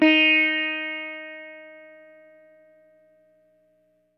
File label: EL SITAR HRD; Quantum Leap - Guitar & Bass